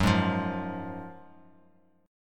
F sharp Major 7th Suspended 2nd, F sharp, down, Bass Standard; strummed; JGuitar